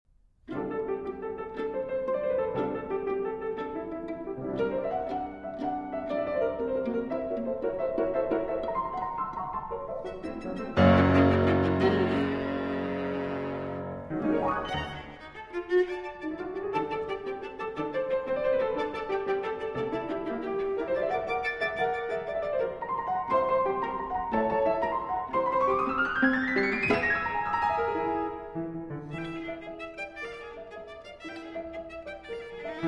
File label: Viola Sonata, Vivace